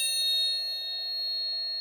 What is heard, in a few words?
Longitudinal, Shrieking rod, 60 cm Steel Rod; georgia 2010